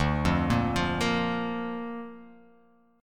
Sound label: D Minor Sharp 5th D down